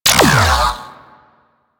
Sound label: Sentient Laser Beam Fire; Warframe; DE; Sentients; Sentient Trooper